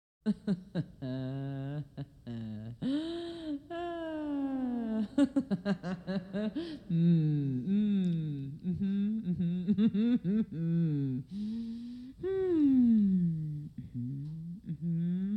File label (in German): sexy gelächter; Lachen